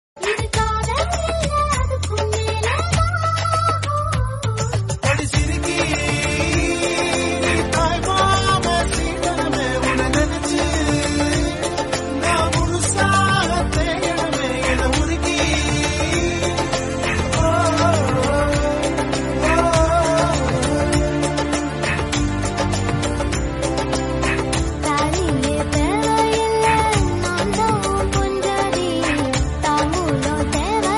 Female Version - Tamil Song

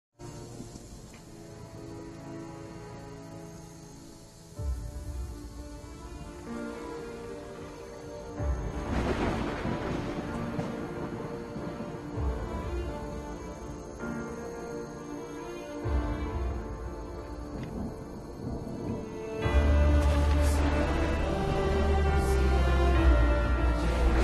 emotional bgm; Adipurush; Om Rout

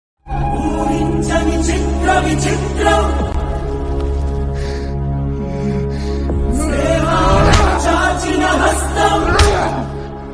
emotional bgm telugu bgm ringtones; rrr; intervel

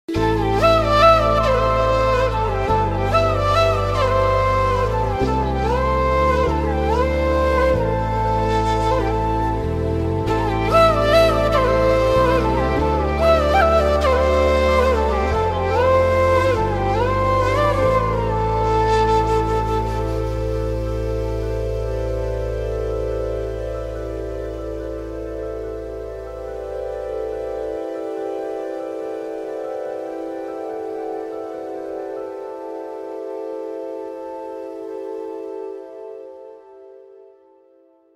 intro bgm ringtone south bgm ringtone edm; Jaanu

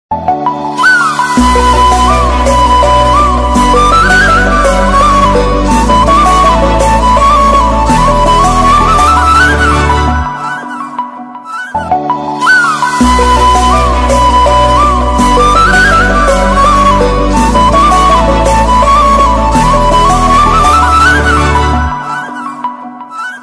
odia love ringtone odia ringtone odia romantic

